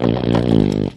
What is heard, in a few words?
fart medium